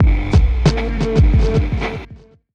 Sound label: jingle